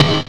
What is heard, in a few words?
RACKZIP, PERCUSSIVE; Rarefaction; Poke in The Ear With A Sharp Stick